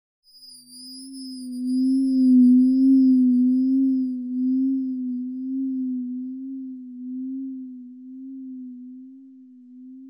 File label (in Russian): visokochastotnij pisk gul